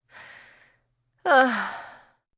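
Sigh Exhausted female, Sigh, female, exhausted, exasperated; human voice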